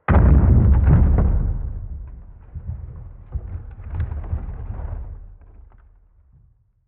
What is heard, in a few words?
Wood impact low explosion sweetener